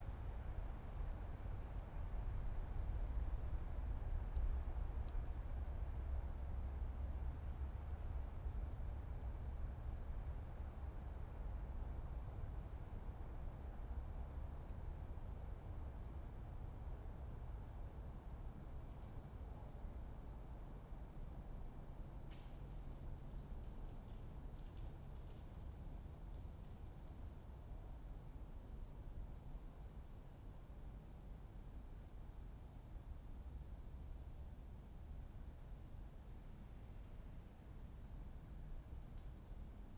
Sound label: yamanakako sound